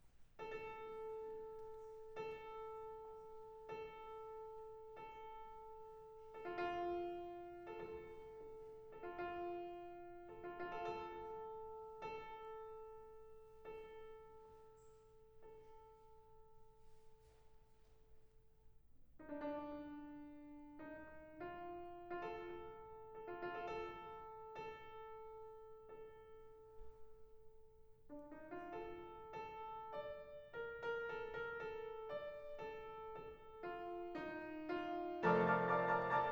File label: Tabuh Telu Piano; "Tabuh Telu," Introduction from "Balinese Ceremonial Music for Two Pianos" (1937), by Colin McPhee